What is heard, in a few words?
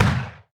tower tricannon basic attack impact single